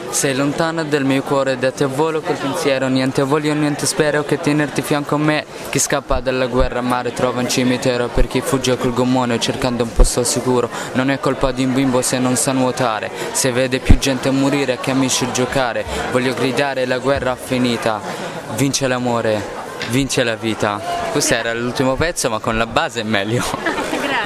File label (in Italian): rap